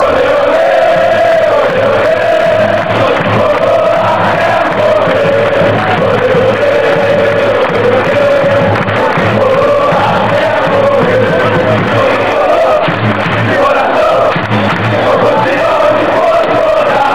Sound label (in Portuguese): de norte a sul sou tricolor ate morrer, fanchants